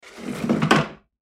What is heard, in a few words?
Chair Slide Into Table Tile Floor; Foley; Blastwave